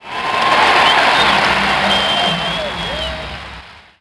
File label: cheer, crowd; Splashdown; XBOX